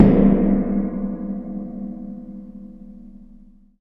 gong

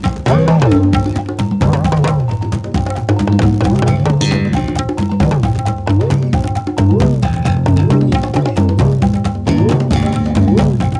RHYTH, LOOPS

